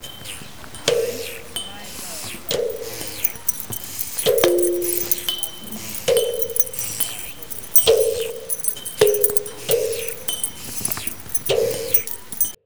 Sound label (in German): Space Sound